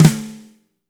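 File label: fat snare; Spectrasonics; Burning Grooves CD2; DRY KIT 3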